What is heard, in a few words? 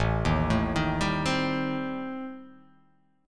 A-Minor 7th Flat 5th-A-down, chord; Am7b5 arpeggiated; JGuitar